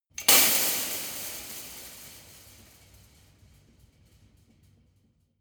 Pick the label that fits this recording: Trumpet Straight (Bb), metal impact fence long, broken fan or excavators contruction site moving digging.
metal impact fence long